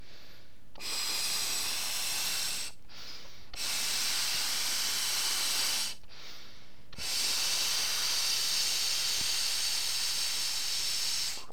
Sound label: Blowing Up Inflatable